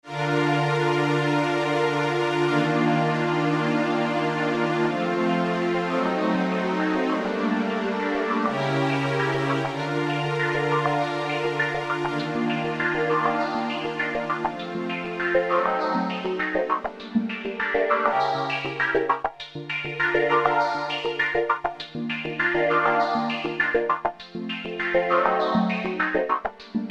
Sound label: String Sample; Murf